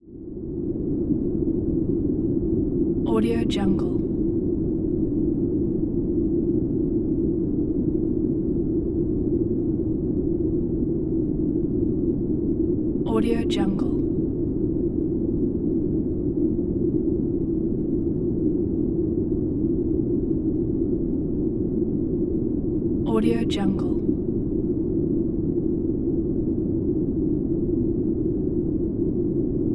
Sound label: wind drone